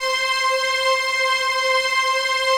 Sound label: PAD, DIGI-PADS